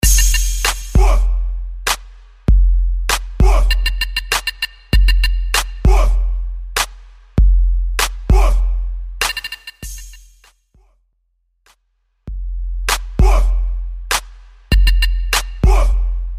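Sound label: 90s Old School Mainstream Clap A Pella Starter Acapella Out; Vegas Edit; 98 Bpm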